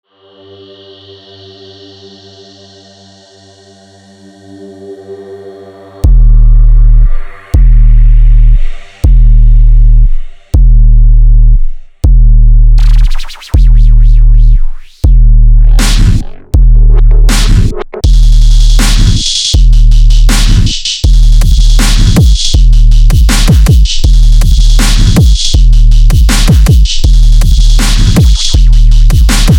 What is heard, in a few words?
DROP